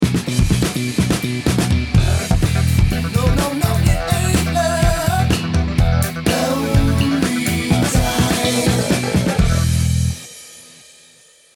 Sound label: ♩＝125 E7 9